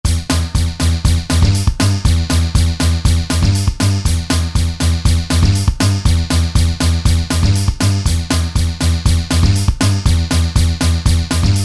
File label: Punk